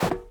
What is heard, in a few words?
jump; Starter-Kit-FPS